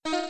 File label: warning; pou